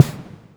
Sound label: Vermona Snare; techno drum fx; Analogue DrumHits